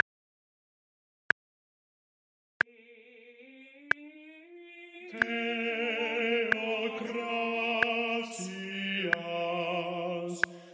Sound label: Bass Click 46 Minim